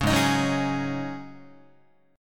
Ab 7th Suspended 4th Ab down Guitar

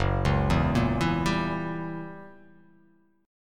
Fsharp 7th Flat 9th down, chord

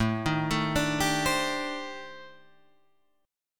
C Minor 6th Add 9th A

